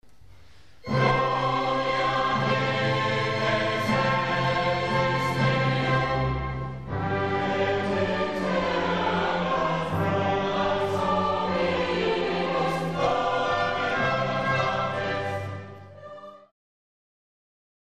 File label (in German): Junger Chor und Pfarrorchester Mozart Gloria; Kirchenmusik